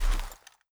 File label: body impact soft